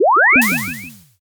warp jump

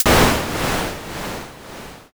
thunder

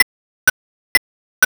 ticktock

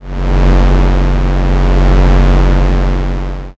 space engine

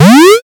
jump

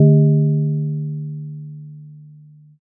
gong

